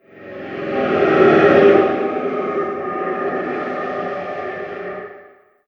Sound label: Random Grinding